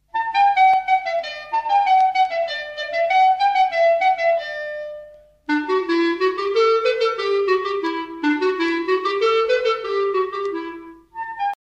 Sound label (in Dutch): Houtblazers klarinet alleen